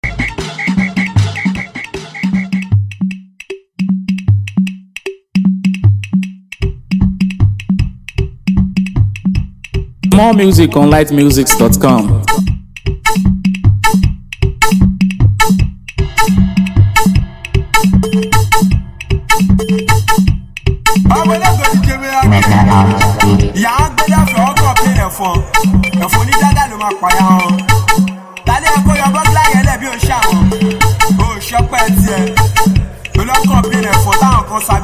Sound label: Afro tune; TikTok Trend